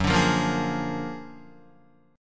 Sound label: Gb Augmented Major 9th Guitar; Gb+M9 strummed; JGuitar